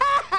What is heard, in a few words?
Menu Popup; The Mask